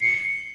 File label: New Alert